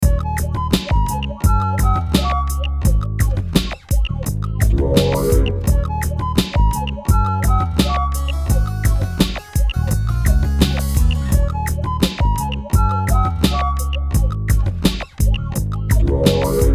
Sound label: hip hop wah wah mix; indaba remixes